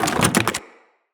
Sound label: Weapon Drop; Weapons; Grineer